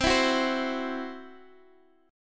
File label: C Major Flat 5th C down Guitar Open G